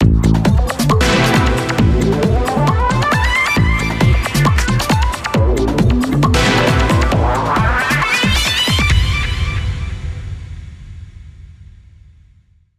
Start Elec; Gran Turismo 5

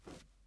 wood jump

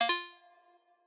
racetrack checkpoint; teardown ps5 windows xbox series xs gamerip 2022

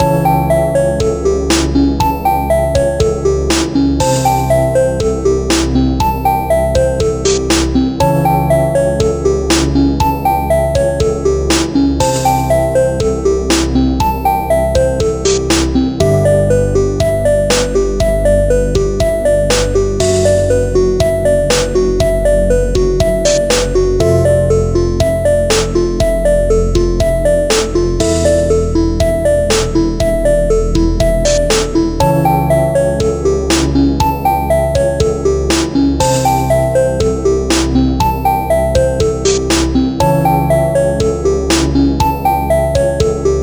Menu Calm; super stone legacy

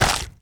Gnaw; ChooChoo Heroes

